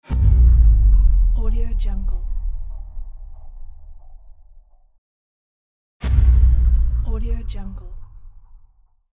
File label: short synth drop; tibasfx